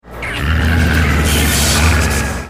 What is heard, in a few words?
growl, wolf